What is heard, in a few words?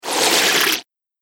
Whoosh Tentacles Transition; Whoosh Sound Effect